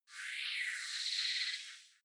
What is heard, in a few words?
sci-fi hologram message high noise; energie